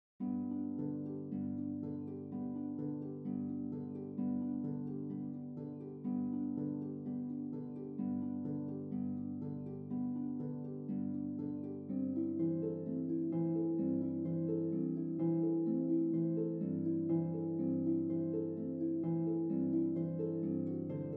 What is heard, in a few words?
Harp; The Swan